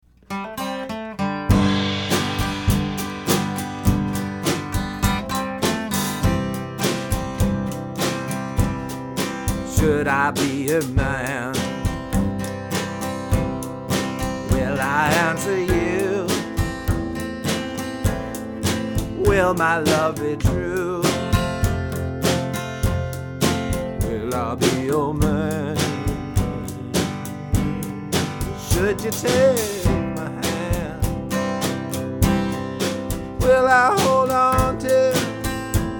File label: acoustic